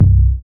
BAS, Dance Bass; Sound & Vision - Gigapack II CD 1

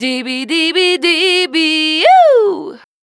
VOC F, Dance Vocals, FEMALE

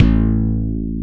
DIGI BASS; DIGI BS

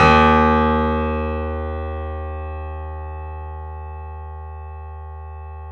D# L, piano; InVision Interactive; The Piano; BOES PLAY HARD

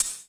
Extract HiHat; Sonic Foundry (Sony Creative Software); Drums & Drones; Construction Kits

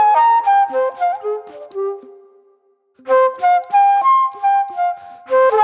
Flute Noir

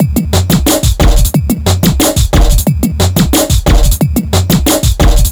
bassline drum 090bpm; Zero-G; Downbeat Dance Grooves

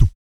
VOC PERC, Vocal Planet Jazz FX BASS PERC

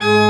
CHRCH, CHURCH ORGAN; Roland - LA Composer vol. 1

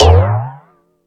Tool Kit, Drum Machines

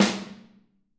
Snare OH; Snare OH All; Drums Percussion

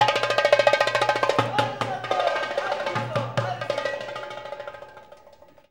DARBUKKA

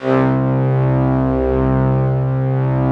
ST-ORG, STRINGORGAN, Yamaha VP-1; Music Store; 90's Sample CDs

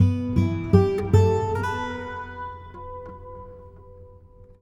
Beach La La, Acoustic String; Sonic Foundry (Sony Creative Software); Friendly Fire

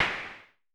SN Low Punch, Vermona Mono15 Drums Snare

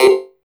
PERC 160 BPM; Ueberschall; Drum & Bass Flash Trackz

